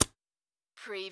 Pneumatic Drill Plugged In

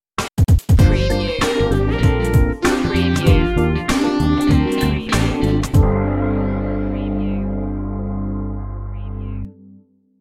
smooth funky love stinger

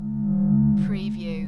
Space Scifi Sound - core hum

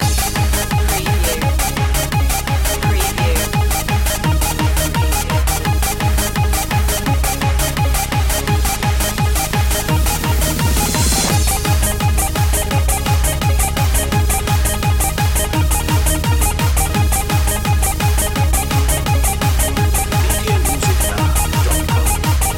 bubble gum beats loop

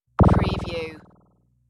Squiggle

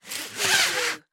Machete Sheath Insert

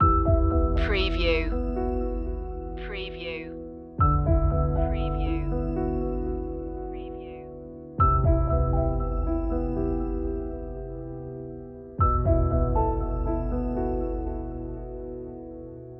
frozen memories loop

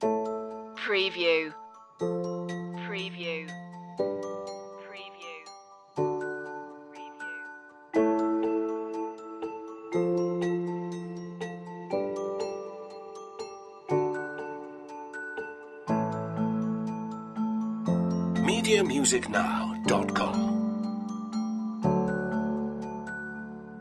bell shoppe loop

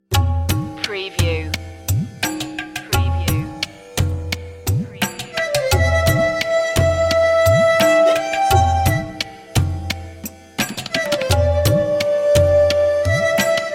indian lullaby

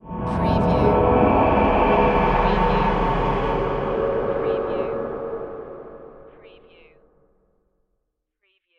Swell Airy

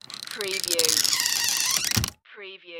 wooden hut door close

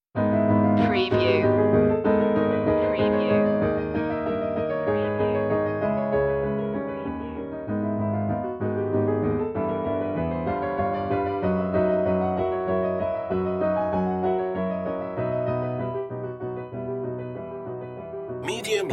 chopin prelude g-sharp minor presto; op28

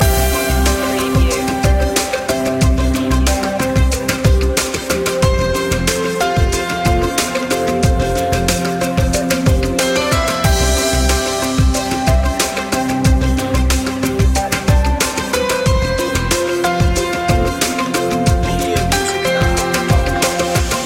emotive presence loop

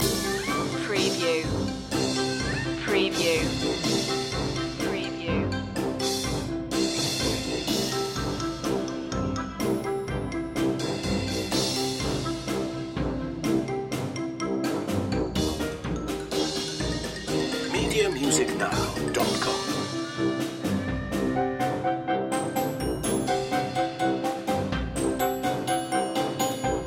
a quirky flourish loop

